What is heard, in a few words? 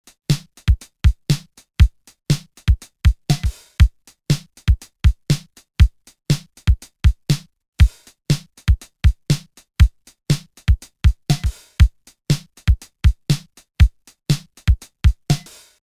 505 Sample